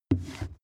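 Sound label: wood step stairs, footsteps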